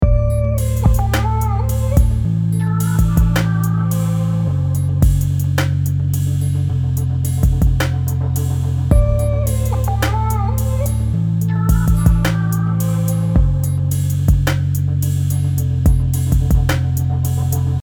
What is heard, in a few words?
loop dark